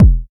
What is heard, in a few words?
subby kick drum f key, Loudest frequency: 135Hz; kick drum samples